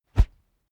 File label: whoosh wood cornered whizz; swooshes-swishes